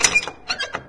lever, buttons; Garry's Mod; hl2

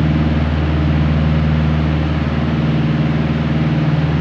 airplane medium idle; Hearts of Iron IV Death or Dishonor

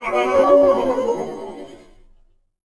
Mech Death Vox; Sid Meier's Civilization 4 Complete; Beyond the Sword; Units

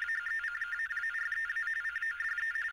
satcoms; ambience; AssaultCube

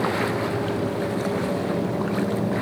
ship idle; Hearts of Iron IV Death or Dishonor

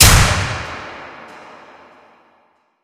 arena lights on; ambient, atmosphere

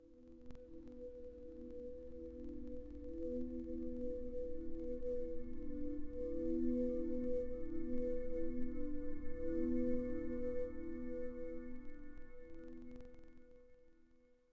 Icy Pad; Ambient; Sid Meier's Civilization 4 Complete; Beyond the Sword

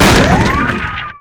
adrenaline impact; Left 4 Dead 2

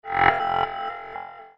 buddy invite; League of Legends; lol air client

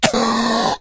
gas gasp, smoker, voice, idle; Left 4 Dead 2

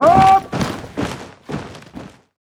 move order army; Hearts of Iron IV Death or Dishonor

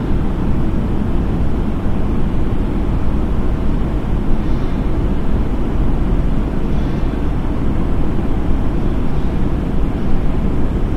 industrial; Duke Nukem Forever

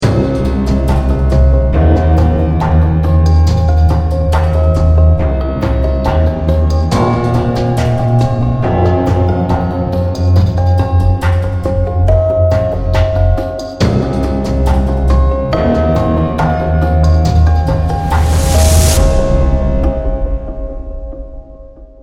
choose team, music; Counter-Strike Global Offensive

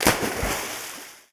jump end water; Mount&Blade Warband

